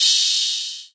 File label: Open Hat, Drum One Shots; Plata; Hip Hop